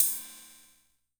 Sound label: Ride, rhythm 55